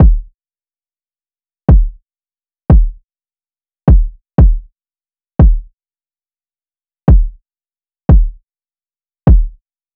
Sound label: Drum Loop, 89 BPM Kick; Loop Stems; Cymatics; Vibes II Drum Loop Collection; Thinking